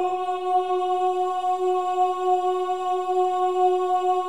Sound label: choir ohs F#4; Spectrasonics; Symphony of Voices